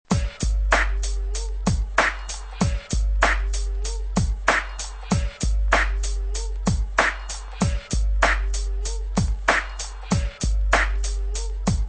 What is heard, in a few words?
drum loops